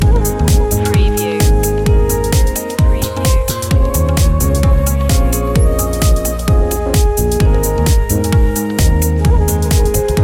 ethnic night life loop

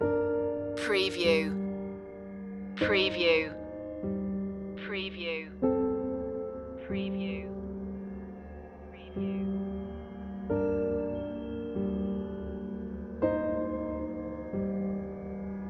soul talk loop

